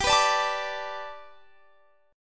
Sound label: G Major Add 9th Guitar Standard, chord; x,22,21,19,22,22; Gadd9 strummed